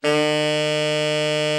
TENOR, Sax; VEL-SW; Giga Samples Collection

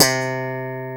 FUNK BS C4, SLAP BASS

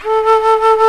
GUITAR FLUTE

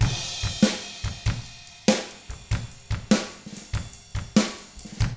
rock 6/8, drums; ILIO; Double Platinum Drums 1

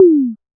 Concertmate-670 Kit Tom, One Shot

